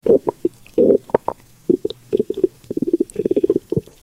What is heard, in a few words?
fetal sounds maternal bow, bowel; SFX Engine